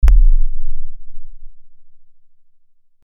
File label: Aliens Monsters; FXHome